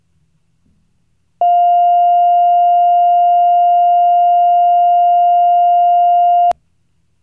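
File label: steady, siren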